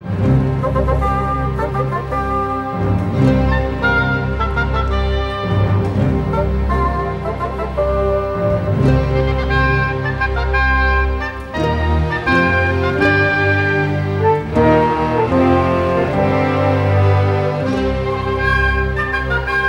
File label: royalty; Manamon Music; Manamon 2